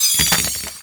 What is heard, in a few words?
Neutralize Alert; Warframe; Mods; Pet Mods